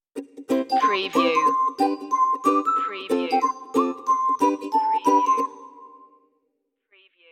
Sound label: reggae happy stinger